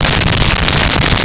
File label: Low End Rumble; Low End Sweeteners